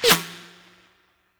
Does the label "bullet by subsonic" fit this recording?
Yes